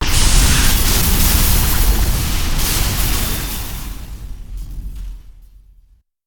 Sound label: gas